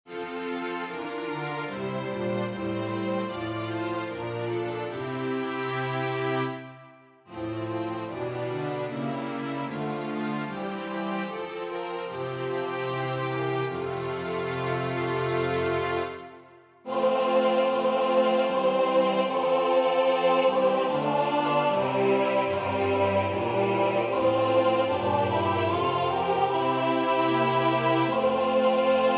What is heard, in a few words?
alto; Salve Maria - patrona Regina Rosarii